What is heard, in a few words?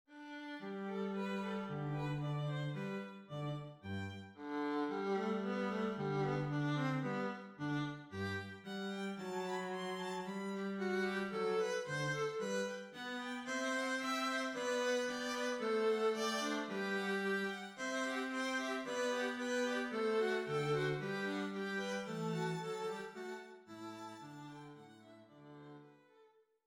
counterpoint strings